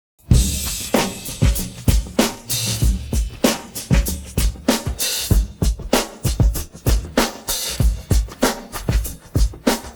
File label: pattern, drums